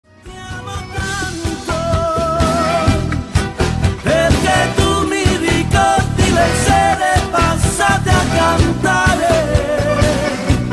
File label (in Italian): Suona Chitarra